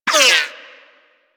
Bullet Impact Ricochet; Warframe; Weapons

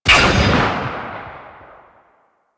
Gunblade Automatic Shot Release, Weapons

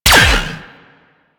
Laser Fire 3D; Warframe; Corpus; Ambulas

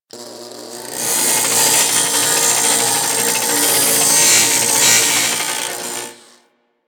Worker Grinder Idle, weapons; Grn Worker Grinder

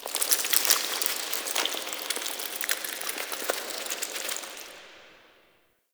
Under City Insect Wet Scurry

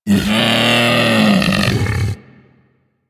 Predasite Vocal Death; Warframe; Hunting Critters

